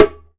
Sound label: Perc Djembe Tone; Circ Afrique V4